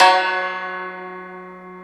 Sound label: Su Gzheng F#2; Multisamples; Digital Sound Factory Planet Earth